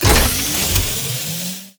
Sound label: Activate Nitro; multiplayer kart racing; boom karts; android